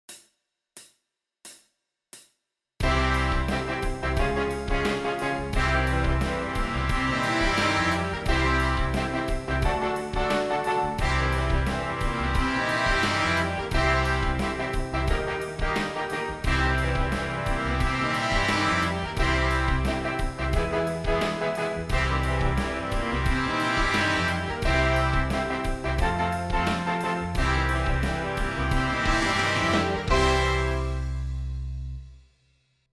Advanced Daily Warm up A Horns in Fifths

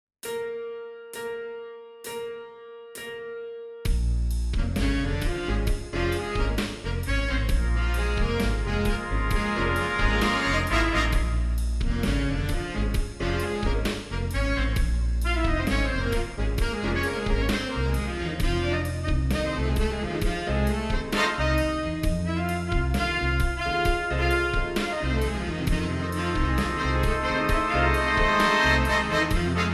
Exercise

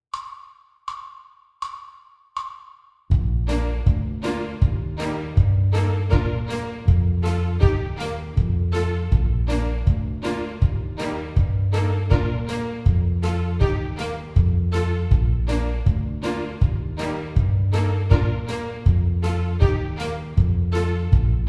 Two Note Slurs

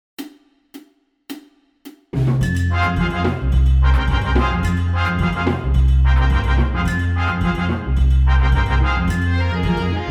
Latin Blues

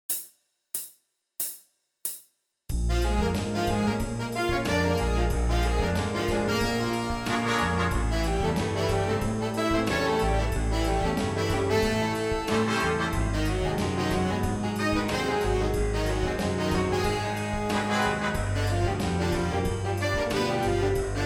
Lip Slur

